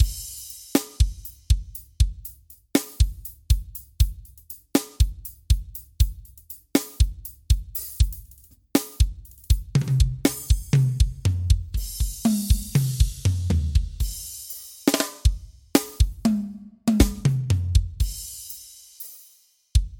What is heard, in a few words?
SD Arrocha